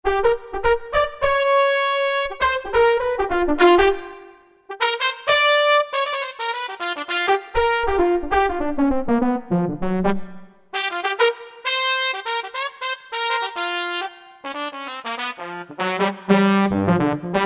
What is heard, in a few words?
BR Trmpets, Yamaha TG77; Factory Presets